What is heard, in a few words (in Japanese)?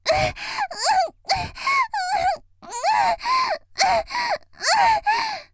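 少女「喘ぎ」無理矢理されて嫌がる; 元気な少女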